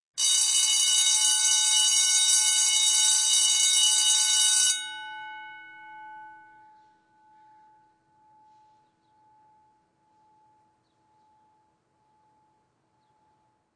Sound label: Beeps; 헐리웃특수효과음; 신호-경적